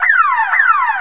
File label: police